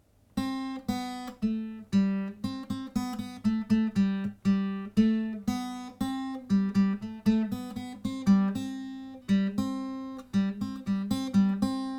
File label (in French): do si la sol musique